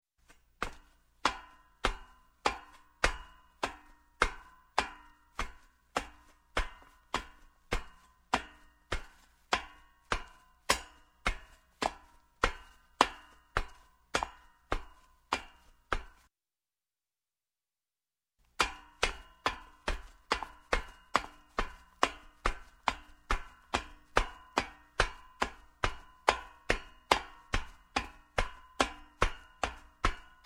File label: Footsteps, Metal Up Metal Steps Shoes, Slow; Sound Ideas 2000 Series